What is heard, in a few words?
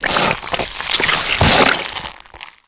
juicing, antlion; Half Life Alyx